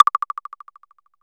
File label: CHURCH WOOD; Music Store Sound Service; Einstein